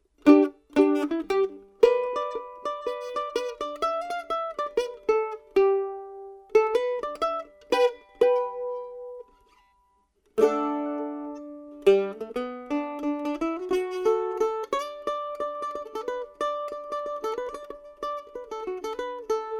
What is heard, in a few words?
Mando Ribbon